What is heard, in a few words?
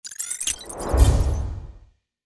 Skill Un Lock; starbites